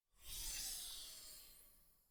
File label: trunk window open hydraulic hiss